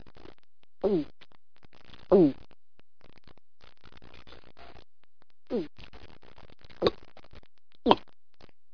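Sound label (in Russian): сглатывание питье глубокое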